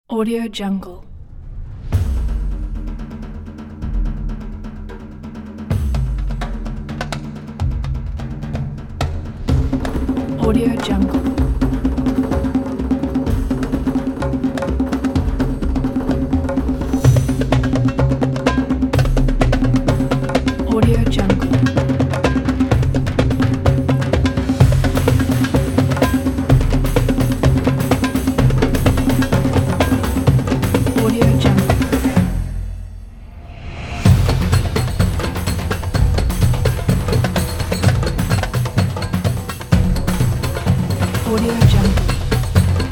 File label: middle east drums